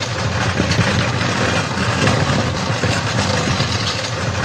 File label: loop drag; tortuga